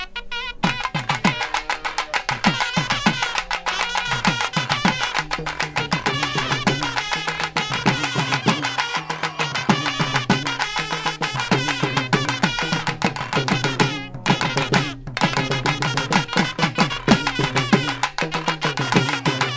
Intro BGM; Karnan; Draupathai

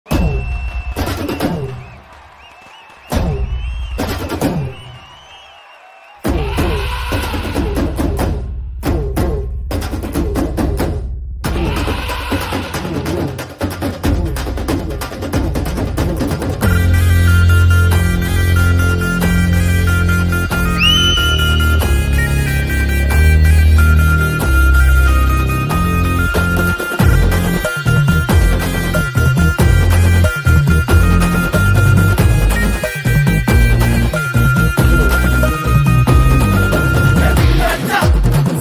Drum Beats Ringtone; Maari

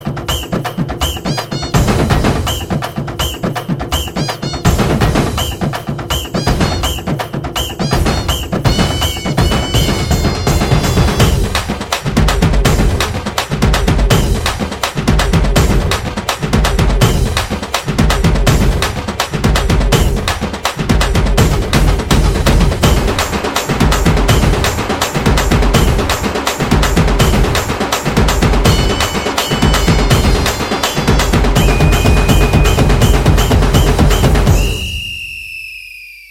Village Kuthu Theme Music BGM Ringtone

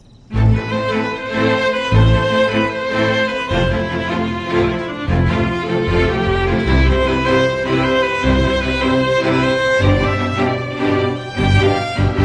Sad Love BGM; Atrangi Re

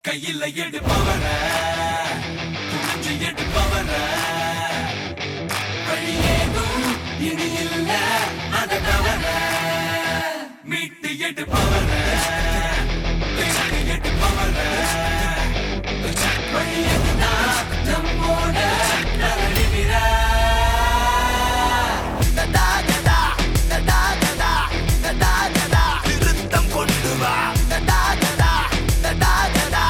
Lyric Ringtone

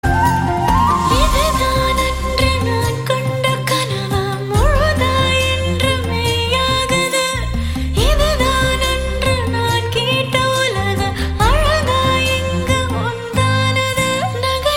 Female

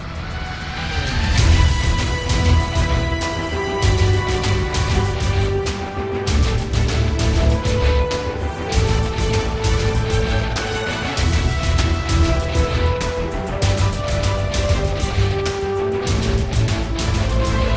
Music Tone; Battlegrounds Mobile India; Mad Miramar

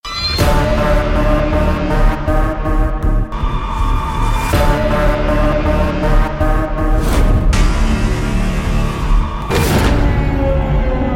Tamil BGM; Arulnithi; Dejavu

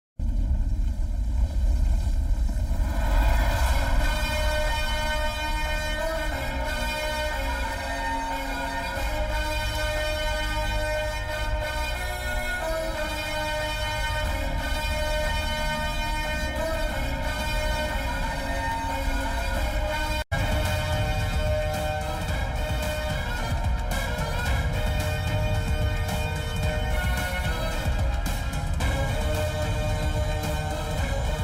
Background Music Ringtone; Mufti

